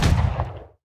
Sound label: mc last shot; planes; gmod; lvs planes; weapons